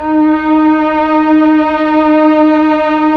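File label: strings Mellotron violin; Roland LCDP09 Keys of the 60s and 70s 1; CMB Melo.Combo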